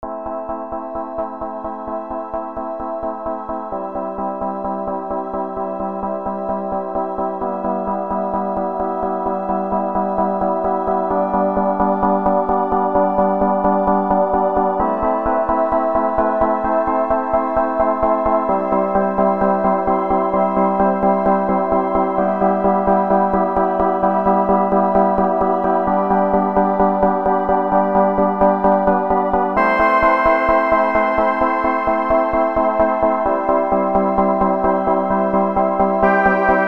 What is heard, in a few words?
orgbient; weeklybeats-2020